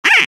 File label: bumper car quack; Garry's Mod; tf2